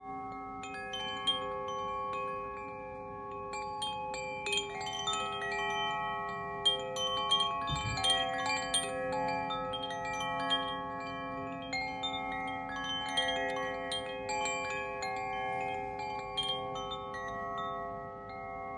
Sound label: sol, 432 hz cosmic bamboo chime set; aurora; luna; stella